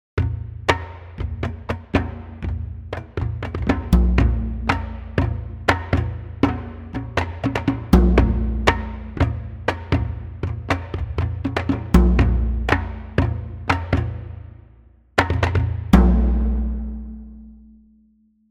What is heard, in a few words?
Percussion; Hans Zimmer Percussion Prof